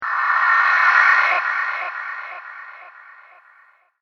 Soul Stealer; horror; monster

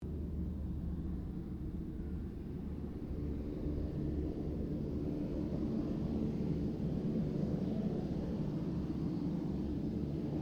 unknown prop takeoff distortion, Airplane; Various unidentified aircraft; RAAF

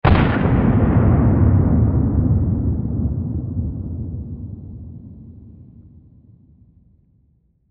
Explosion Dynamite Demolition Blast Distant; Military; Warfare

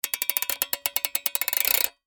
Steampunk Gear, Scifi, Steampunk, Gear, Lever; Steampunk (Sound Effects:Science Fiction); BlastWave

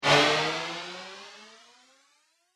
Space Bike Accelerate, spacecraft sound effects

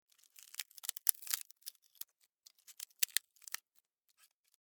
Insect Termite Bite Wood Long Splinter; Termites; Animals:Insects; SFX Bible 6

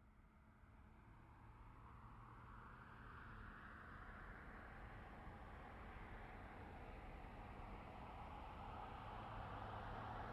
Truck GMC Savana By Med; Vehicles

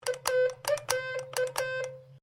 MORSE CODE PERIOD; Morse code aplphabete; Morse Code Sound Effects; Morse Code (Sound Effects:Electronics)

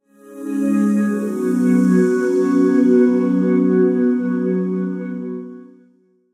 Multimedia Chime, Technology, Multimedia, Chime; Chimes

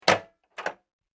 Kitchen Opening Microwave; Kitchen Sound Effects

